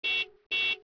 horn; Microsoft Graphics Studio; Home Publishing 99

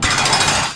Vault Door; Holger

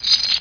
Tambo Money

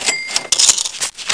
money

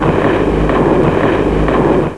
MARCH, robots

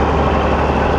Light Tank Run; 1944 Battle Of The Bulge

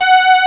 pling

